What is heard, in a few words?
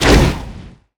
Crossbow Fire Cast; bullet